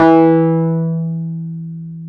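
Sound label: Wurly E 4, piano; Keyboards of The 60's and 70's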